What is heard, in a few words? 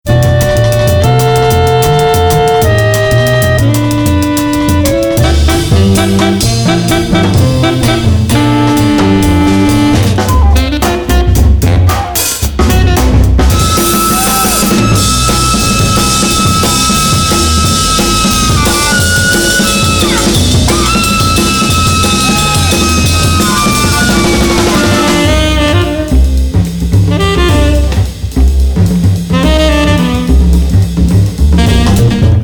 JINGLE